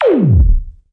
smatter zap

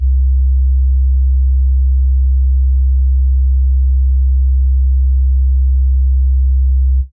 Smooth 808 Long; drums; single hits; Khezie 808s

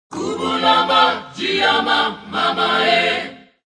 african vocals gubulah low

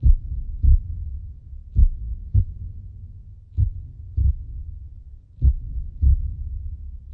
heartbeat superverb

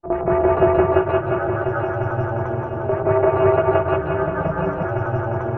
chime tweaker; Various Strange Sounds and Effects

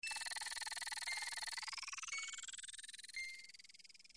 computer timing belt; Computery or Science Fiction

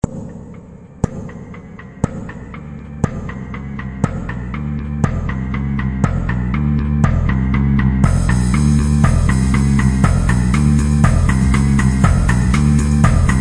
bass click intro

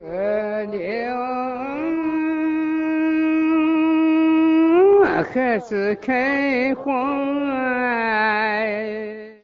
miao male vocal sung, love songs

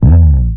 bass bomb dive; Basses

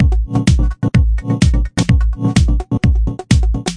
127 minimal house chording; SAMPLED MUSIC LOOPS

